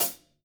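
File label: STK FOOT left; Sampleheads; Peter Erskine Living Drums; SD KITS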